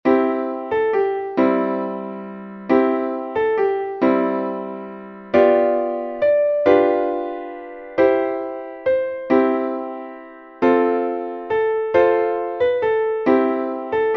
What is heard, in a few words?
Chord Melody Solo in C; SNight